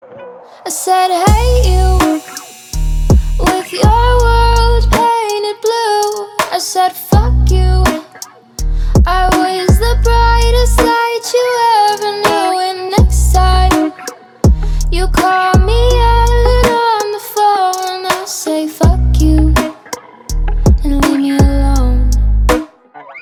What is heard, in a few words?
Sad Ringtone